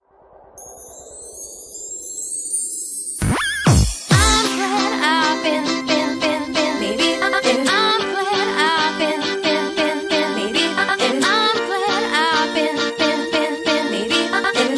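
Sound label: Organ Flex, Bassline House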